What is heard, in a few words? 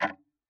Tick, Clocks Kitchen Clock